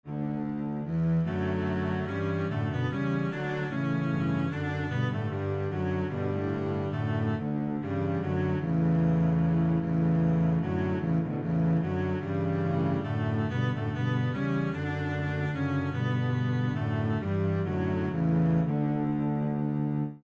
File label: minuet, string bass